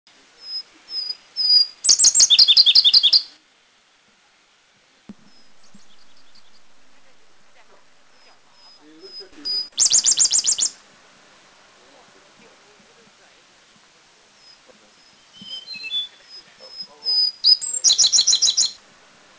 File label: Indian Blue Robin, Dirang, India